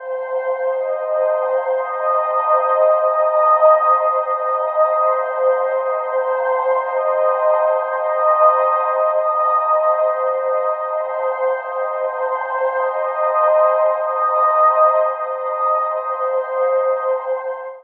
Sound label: Reflective CEb; Zero-G Ambiosis; Light